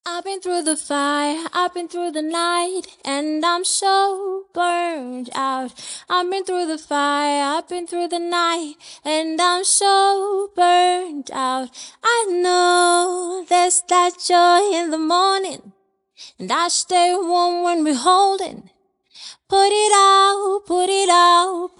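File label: Pop Rnb, 150, A minor, Acapella; Female; Singing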